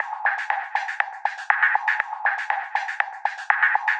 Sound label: Percussion, Trance NRG, Drums; Sonic Foundry (Sony Creative Software); Trance NRG VOL-2